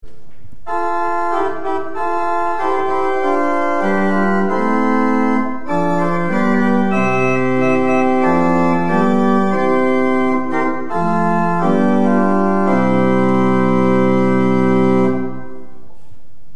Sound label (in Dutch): orgel, Holpijp 8', Roerfluit 4', Quint 3', W2 (?)